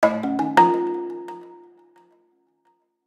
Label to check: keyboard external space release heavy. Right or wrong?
Wrong